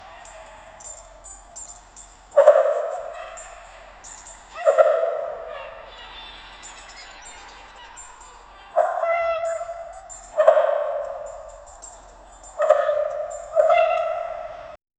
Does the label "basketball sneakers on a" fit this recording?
Yes